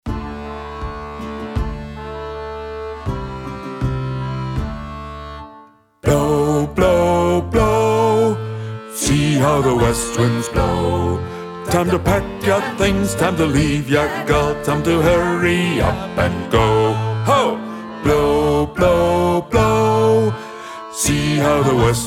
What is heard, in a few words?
Bass